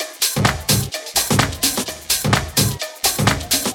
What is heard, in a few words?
Percussive Loops 128BPM; Vengeance Samples